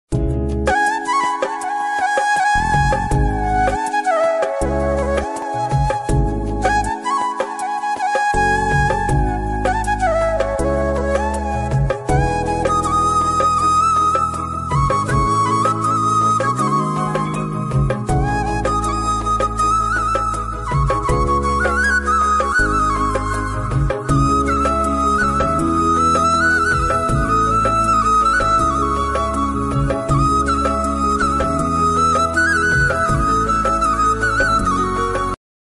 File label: flute ringtone heart touching ringtone instrumental ringtone